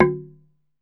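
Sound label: TUNG LO, Tongue Drums, Pitched Percussion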